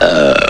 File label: mobile polyphonic ringtones